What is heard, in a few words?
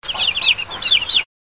Chicks Peeps